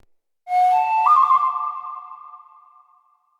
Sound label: Animal Flute Call; Player Instrument; Animal Summon